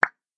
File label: pop